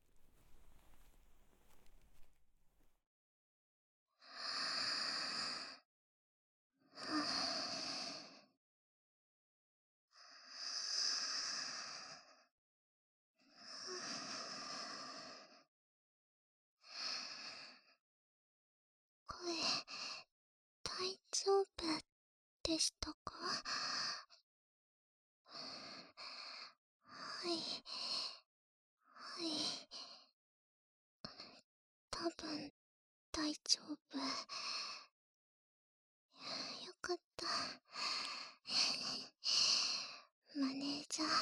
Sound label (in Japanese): こっそり耳舐め; トップアイドルのヒミツの性癖; ライブ前、緊張をほぐすためにオナニーをする莉彩